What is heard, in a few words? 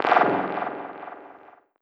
Vortex; Dance; SFX